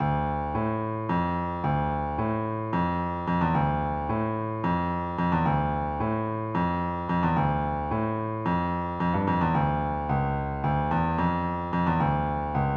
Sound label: fugue